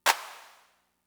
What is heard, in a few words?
Clap; Fricke MFB-522